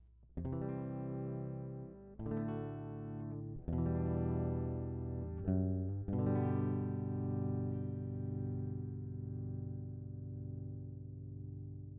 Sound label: guitar chords baffle only output slow